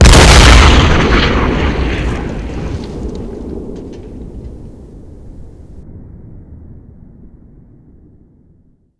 Artillery; Red Orchestra 41-45

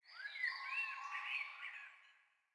sting songbirds; Rust

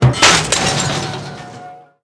metal lamppost creak; World of Tanks Blitz

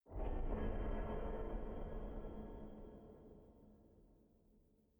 sting metal groan; Rust

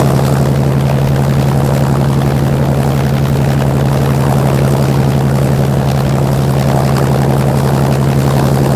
griffon rear, engines; War Thunder

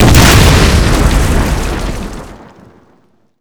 explosion suitcase bomb close; Call of Duty Modern Warfare

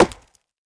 tree impact; Rust

